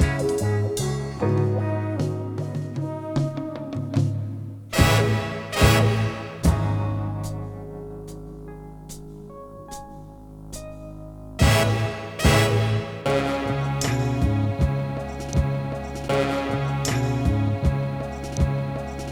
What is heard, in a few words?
horns, phonky loops